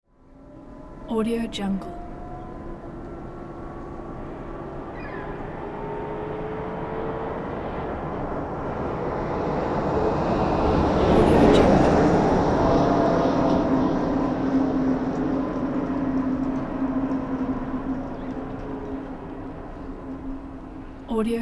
vintage electric car drives by; hollywoodedge

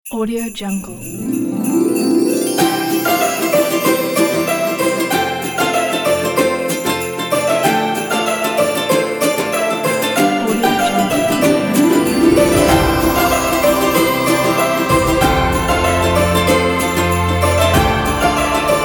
a christmas pack

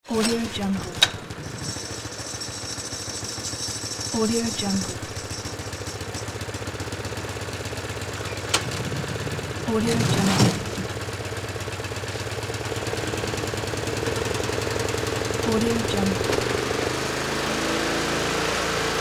lawn mower

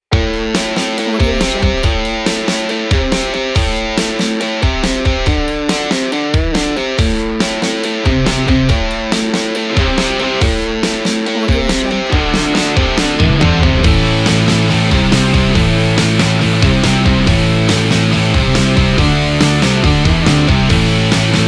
extreme rock

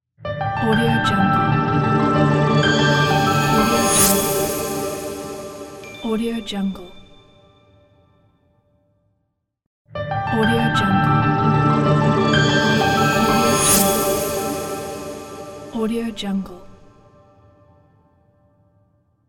piano bell atmosphere logo

